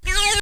slide sfx

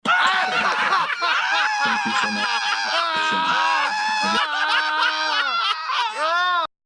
crazy laugh; musers

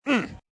grunt

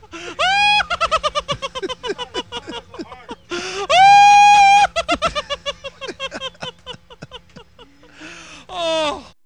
fake laugh

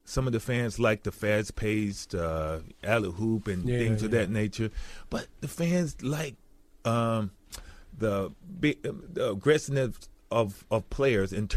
aggressniff

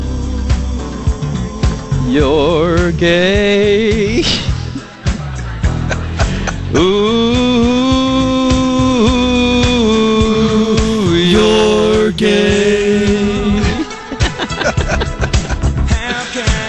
gay singing

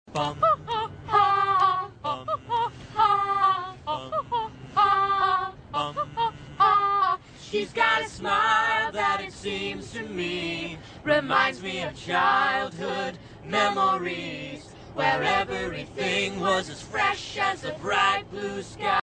Singing In Car; Step Brothers

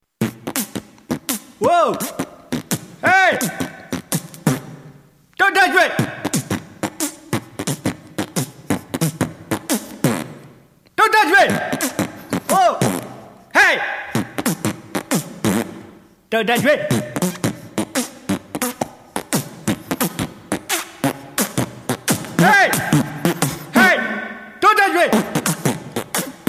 mouth noise song

